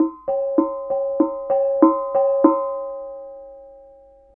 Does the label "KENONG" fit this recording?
Yes